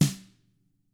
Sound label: TAMA; ILIO; Double Platinum Drums 1